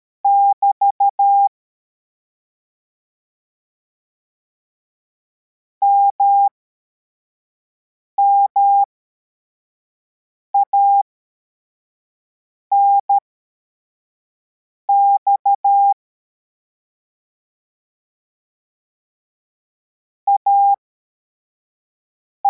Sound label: cw, morse